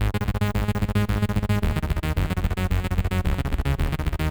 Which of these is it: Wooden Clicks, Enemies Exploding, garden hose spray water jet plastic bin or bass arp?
bass arp